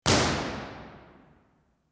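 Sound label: room impulse response, concert hall; jack lyons; university york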